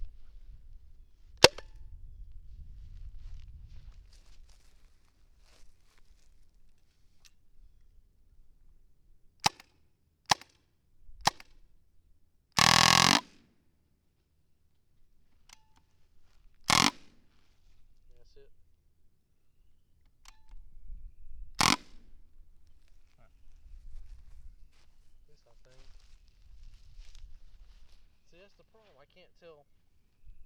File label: carbine from back 4 shots dry fart short barrel, guns; pro carbine; 68 carbine